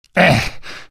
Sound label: monk pain; Source Engine; video game; ravenholm